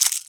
RATTLE, INDIAN PERC

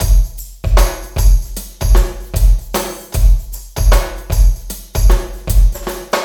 LOOPS

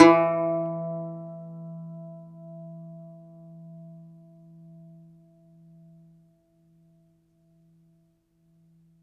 DOMRA, Segundo Domra, Plucked Strings

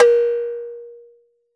Tabla Tong; Roland BK-5; Percussion

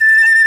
SHIAO A4

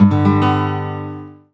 STL STRNG CRD; Best Service; World Colours

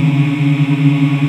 P C#3, CHOIR; Spectrasonics; Heart of Africa 1; CHOIR MMS

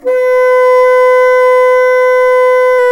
B4, Bassoon Swel; Roland LCDP04 Orchestral Winds; 90 s Sample CDs

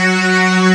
P5 STRINGS; E-MU Formula 4000 Series Vol. 5; Protozoa; Vintage Presets

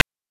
PITCHED NOISE; NES DPCM; MIDINES

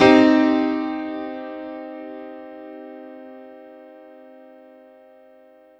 Piano stack (C) min (triad); Sonic Foundry (Sony Creative Software); Ugly Remnants VOL-2

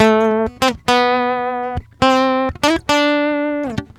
GUITAR SALSA

